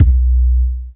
BD, HOUSE

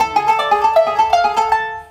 World Lick

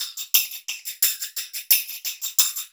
TAMB; Zero-G; Chemical Beats

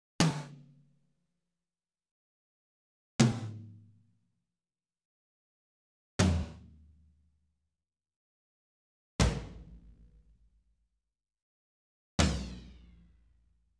Tom 1 - 5; Sound Sampling Collection VOL-5; Drums & Bass Fusion Funk Effect

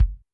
KICK; Q Up Arts; Denny Jaeger Private Collection; KICK1 MODULE

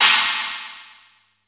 CHINA CYM, D110 DRUMS; Crypton - DrumBase5000

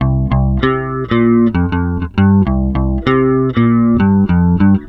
NUTS 98 C, Bass Grooves; USB Soundscan vol.30; AKAI

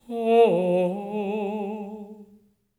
LYRICAL; Spectrasonics; Symphony of Voices

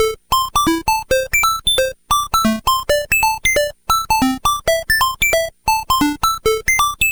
Game Boy Synth; 8-Bit; Chiptune

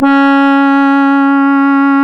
C#, Synclav FM, BRASS; Big Fish Audio

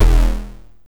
Synth Hit E

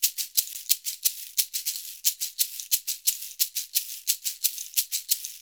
SHAKER, Modern Percussion Loops; USB Soundscan vol.56; AKAI

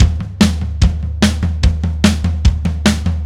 Beat, Indie Rock; Silos; Sonic Foundry (Sony Creative Software); Indie Rock Anthems; Session 11 - Silos

